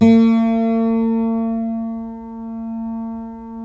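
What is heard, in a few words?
GUILD 12-string, Guitars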